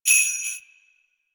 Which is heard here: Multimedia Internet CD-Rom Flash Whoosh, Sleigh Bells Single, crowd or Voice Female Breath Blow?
Sleigh Bells Single